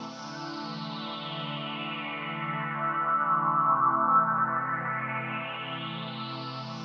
techno pad 140; Techno2; Keys

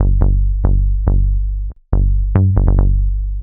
ELECTRO BASS 140 A

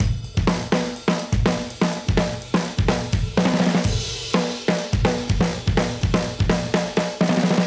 Modern Breaks; Touch Loops; ClassicBreakSessions

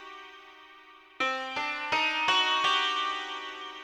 ethno, 125, A

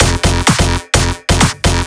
filler tool 128 F; Electro; filler tools; Anthemic

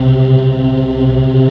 warm pad; Harmor; Generators

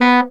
B SAX STC B2, Baritone Sax Stacc; Orchestral; VarazdinOrchestral

